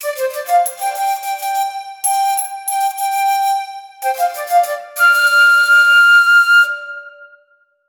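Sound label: nepali movie trailer flute; ethnic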